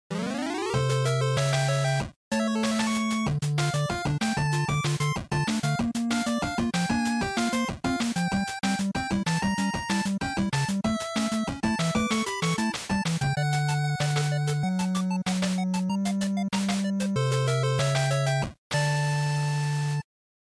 NES Action Title

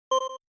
SNES Fighting Select

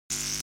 Electric Shock Short

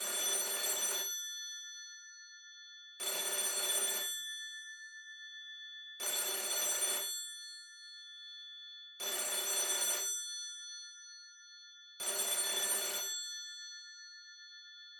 Rotary Phone Ringtone Loop

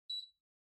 Air Conditioner (Far Beep)

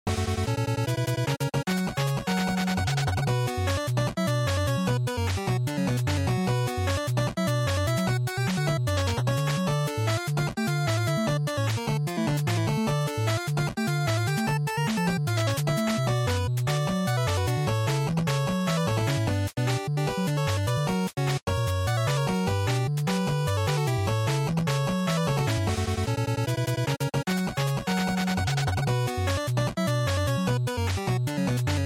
NES Action Boss

